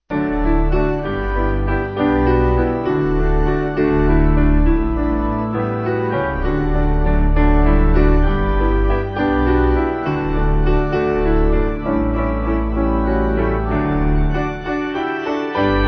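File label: Piano Organ, Pipe Organ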